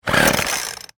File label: Weed Wacker Pull, False, Start; Household; Garbage Sound Effects